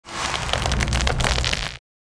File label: Nature magic spell entangling roots